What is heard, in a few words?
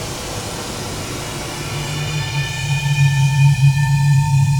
noise bottle